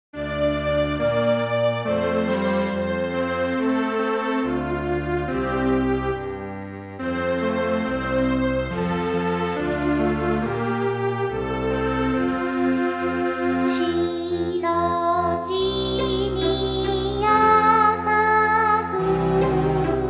Strings; 初音ミク

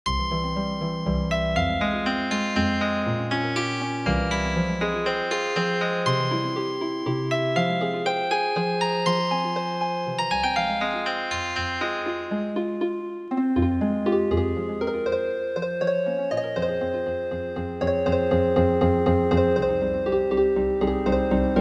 Healing Harp

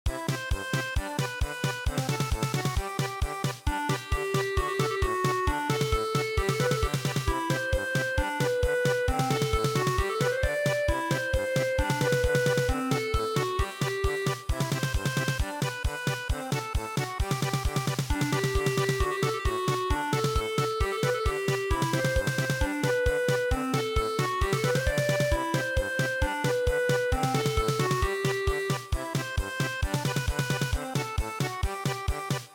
German Polka, midi traditional

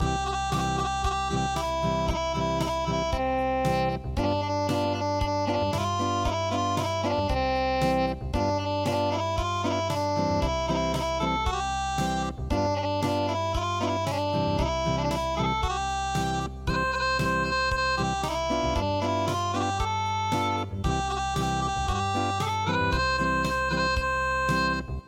Acoustic

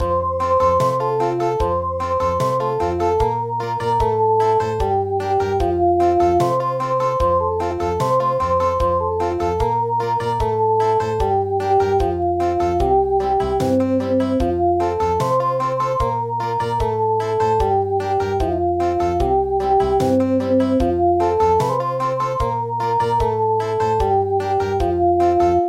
Dance, Country; Shue Makers Dance

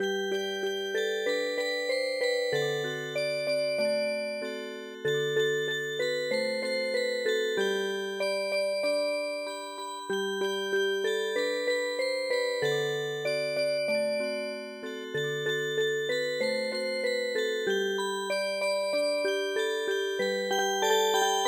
Music Box, midi traditional

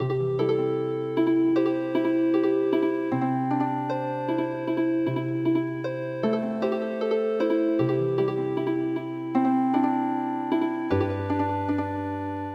Healing Harp, midi traditional

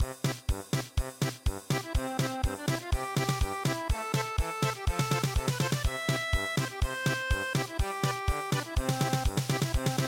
German Polka

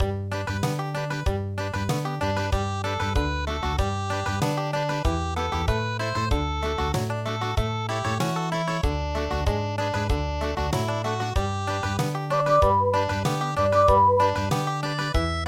new leaf song Country

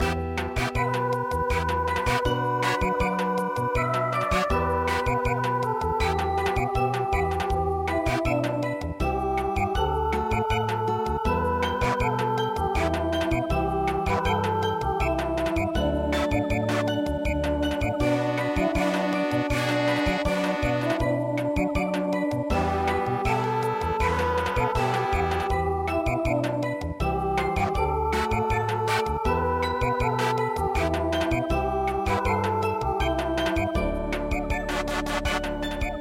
Samba

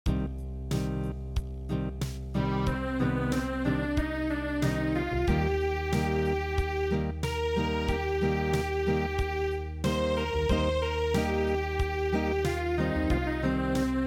Acoustic, traditional

